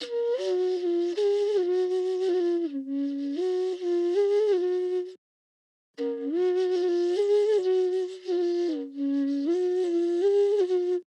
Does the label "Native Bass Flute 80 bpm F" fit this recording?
Yes